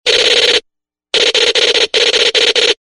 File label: phasers on fun